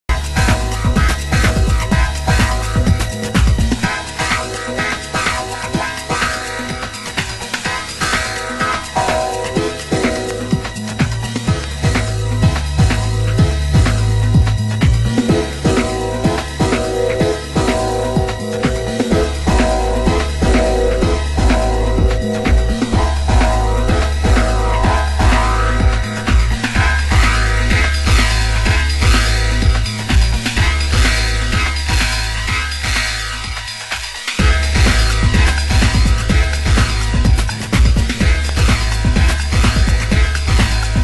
jazz

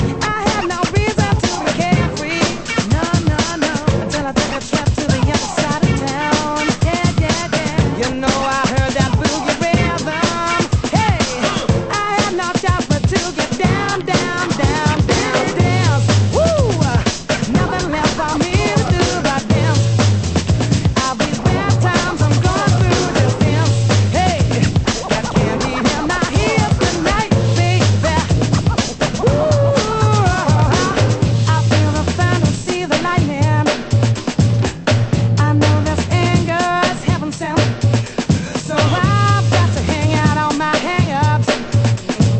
funk

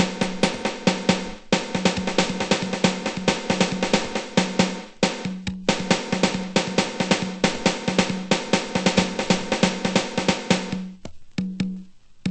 drum

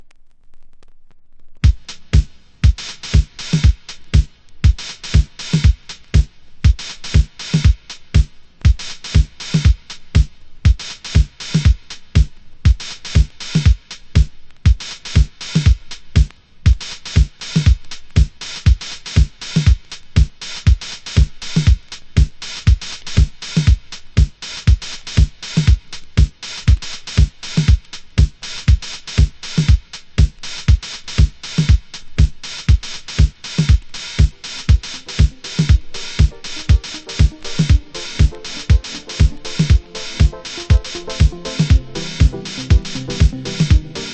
disco

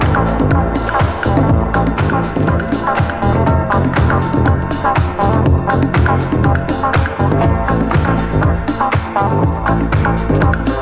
funk

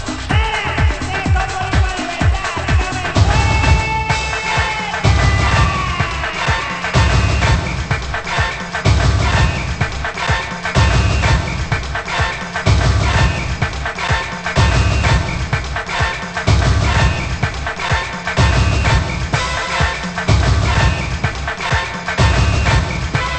drum

